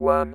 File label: wave